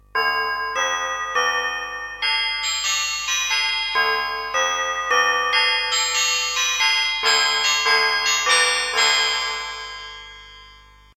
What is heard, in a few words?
Oriental; Kawai K1; Presets Bank 2 card A